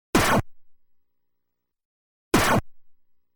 Hero Damage Sound (Big); Kaijuu Densetsu The Tale of the Shell Monsters

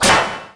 hit metal

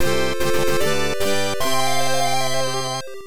Jingle (Level Up); rpg tsukuru dante98 ii pc-98 1996

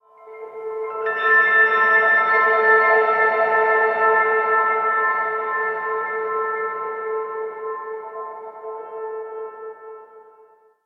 Map Piano; No Man's Sky

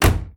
Hummer Door Close

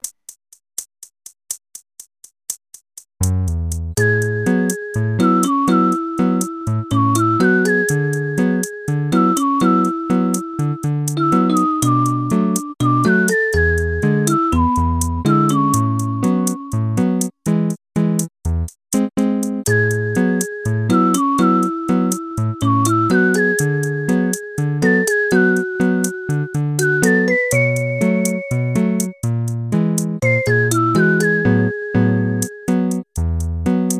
Bossa (Aircheck); animal crossing full gamerip

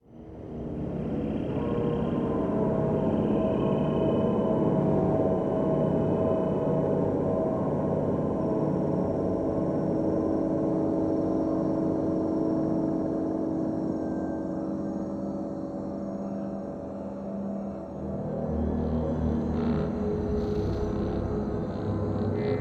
Event Death

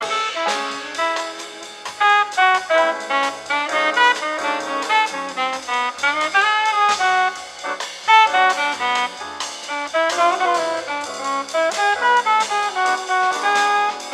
Jukebox Bossa Nova

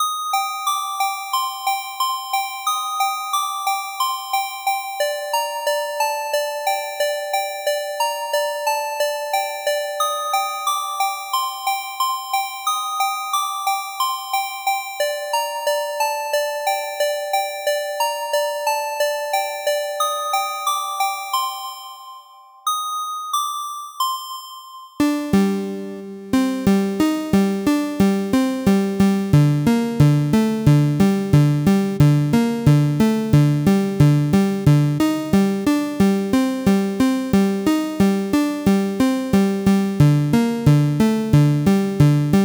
8bit; MeetMcGuffin

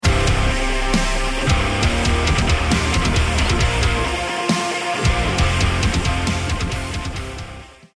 round mvp anthem

